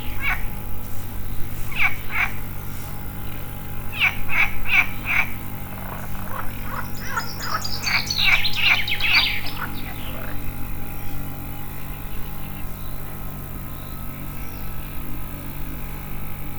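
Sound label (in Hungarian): tavi béka erdei pinty röcsögő; Tiszababolna; termeszetben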